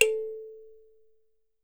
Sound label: THUMB PNO, African Melodic; World Combos; E-MU Producer Series Vol. 7 – Old World Instruments